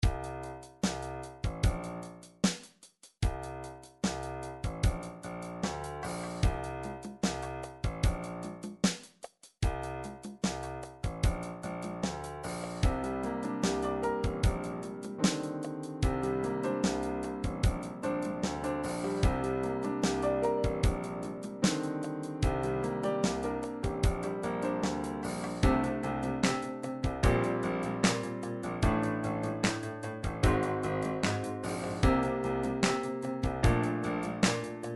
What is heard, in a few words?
triphop; Newtronic Triphop Construction Kit